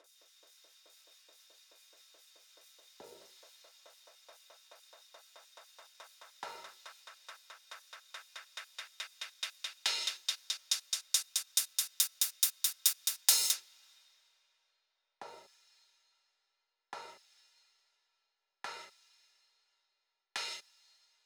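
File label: 140 BPM Intro Break Drums hi-hat; Vengeance - Essential Clubsounds Vol.5; VEC5 140 BPM Vengeance Intro Kit Root E; Drums & Perc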